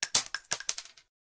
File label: brass, weapons